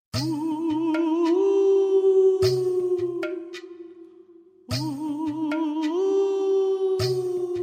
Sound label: Horror music